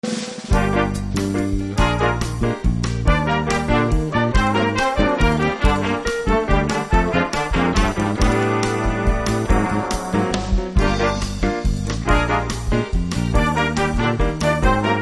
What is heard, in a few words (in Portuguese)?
orquestra bossa jazz